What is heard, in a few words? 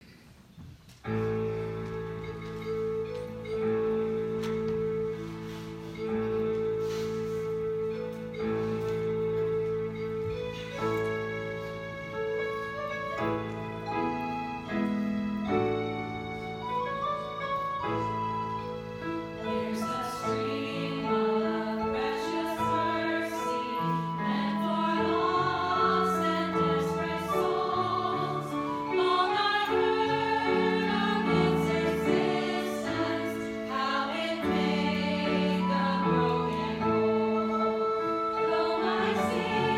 Hallelujah Jesus Saves Choir